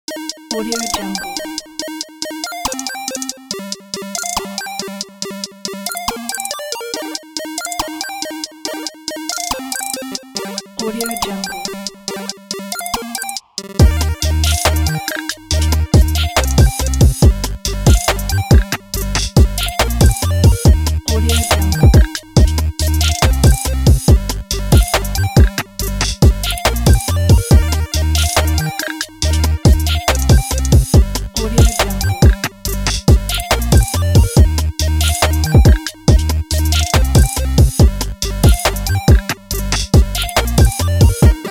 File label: 8bit trap beat